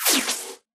imp bullet generic titanshield; Titanfall 2

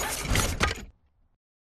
combat run to idle forward; Titanfall 2

